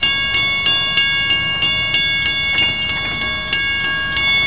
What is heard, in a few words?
railroad crossing